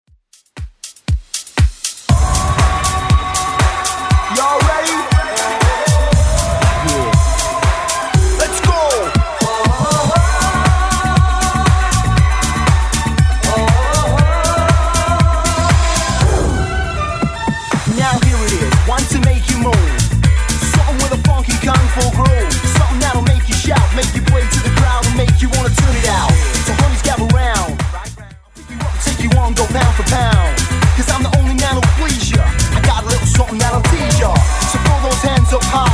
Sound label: 90s Redrum 119bpm